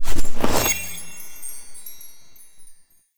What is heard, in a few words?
Present Mask Foley; Warframe; Ambience; Duviri; Bombastine Encounter